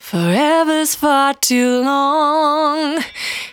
Cry 68bpm B, Vocal; Zero-G

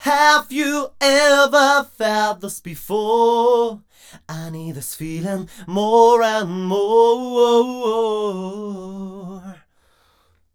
m 128 e-flat, Vocals

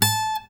pop, Guitar

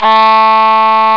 OBOE, REEDS; CMI Sound Library Rev 1.3

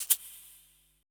E SHAKER, ETH PERC

